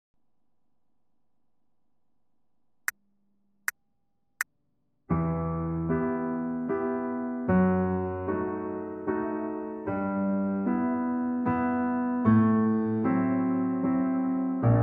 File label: Slavonic Dance 75bpm